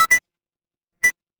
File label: retro game coin